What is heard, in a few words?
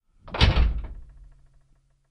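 Cutlass Interior Door Close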